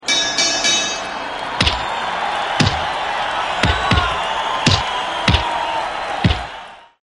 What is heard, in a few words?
Ultimate Boxing Alert Tone